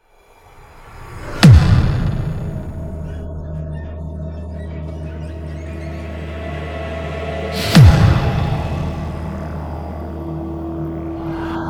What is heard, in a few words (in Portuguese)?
som automotivo funk bass